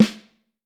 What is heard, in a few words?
Snare Drum, 5X14; Q Up Arts - Johnny.C's Vintage Snare Drum